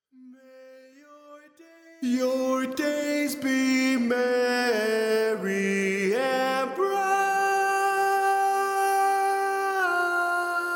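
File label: Baritone, barbershop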